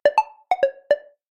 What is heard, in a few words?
Elegant Hard, notifications; Infinix; XOS 15